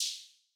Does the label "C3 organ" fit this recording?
No